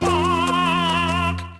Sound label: fly; lego my style preschool